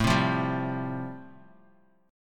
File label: A-Minor 7th Sharp 5th, Bass Standard, chord; Am7#5 strummed; 17,15,15,12; JGuitar